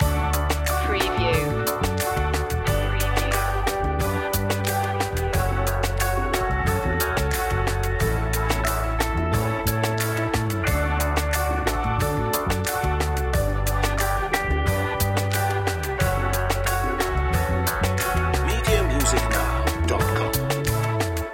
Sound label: moments of joy loop